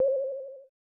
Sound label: Item Chime; Krusty Mug